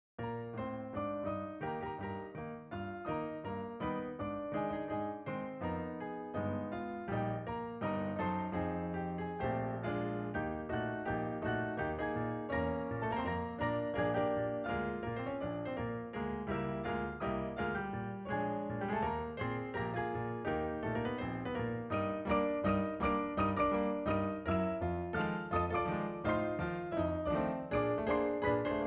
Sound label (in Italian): Romanza Fox Trot, pianomelodico